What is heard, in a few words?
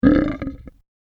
Evil Monster Growl; Monster Sound Effects; fantasy sound effect